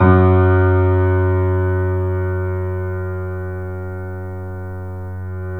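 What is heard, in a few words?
piano, F#, Yamaha; InVision Interactive; The Piano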